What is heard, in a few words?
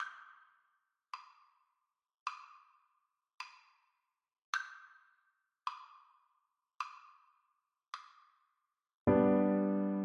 amid the silence, recorder